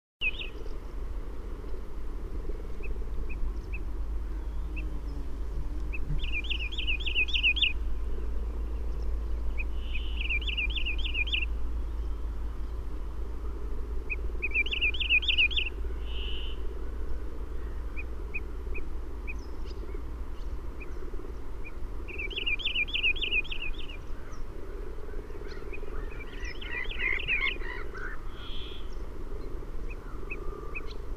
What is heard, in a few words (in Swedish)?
Gronbena